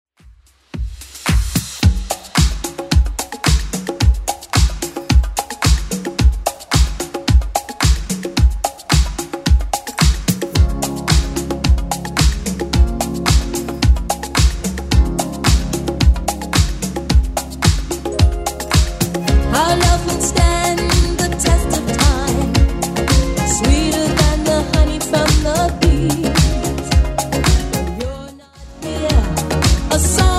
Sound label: 80s Club Edit 110bpm